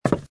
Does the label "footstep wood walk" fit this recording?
Yes